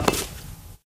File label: black tile, footsteps